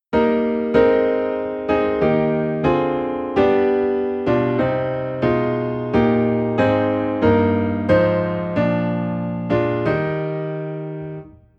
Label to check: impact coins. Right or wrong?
Wrong